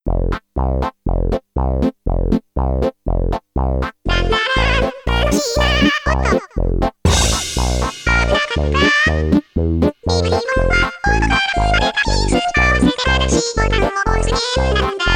Vib-Ribbon Blues

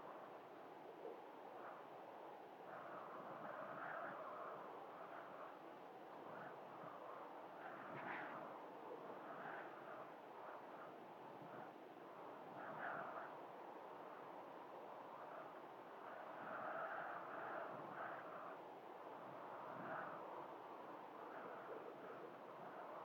Wind Tunnel Loop; The Inn; Devour 2021 gamerip pc macos

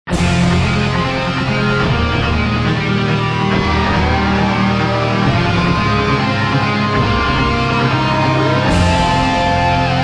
Modern Agitated; Civilization Revolution; Xbox

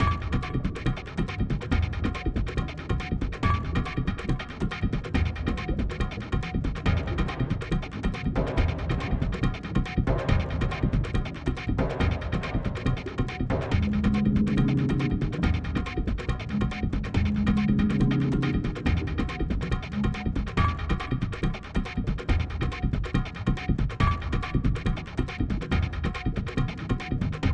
Boss Music; crouching pony hidden dragon

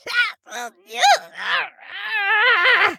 stagger; devour

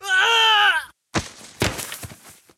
Diraqiarcher Death

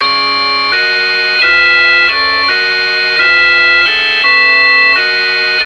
organ

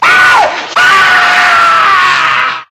Soldier Scream short

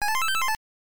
COIN; Dig Dug II; NES

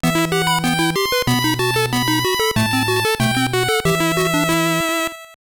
Jingle; Nakayoshi Pet Series 5 Kawaii Hamster 2; GBC

